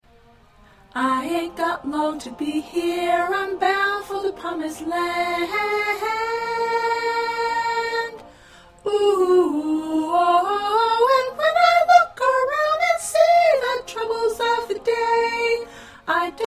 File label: Soprano 1, choir parts